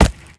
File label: Fishmen Footsteps Dirt Run